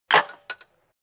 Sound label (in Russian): phone